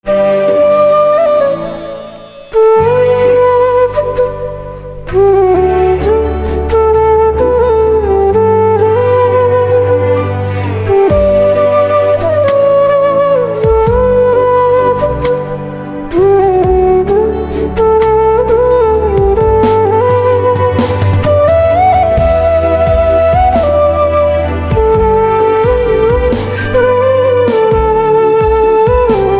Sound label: Flute, instrumental ringtones